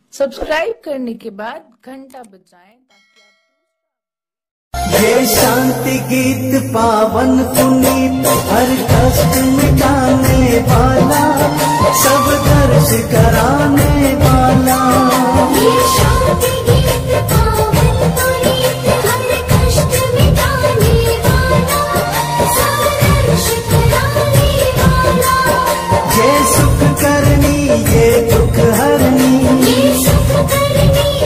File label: bhakti bhajan ringtone; vishnu ji ka bhakti ringtone